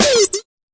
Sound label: fx drum